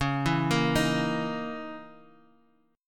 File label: Db Minor 6th Add 9th Db down